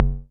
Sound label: Multi-Low-Perc